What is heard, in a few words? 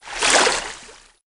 get Fish; Project Zomboid